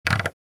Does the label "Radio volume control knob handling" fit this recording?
Yes